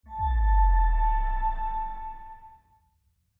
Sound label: ripple